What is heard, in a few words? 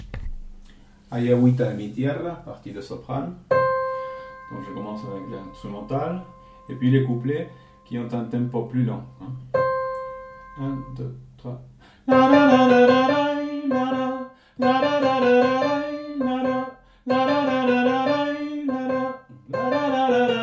Ay aguita Soprano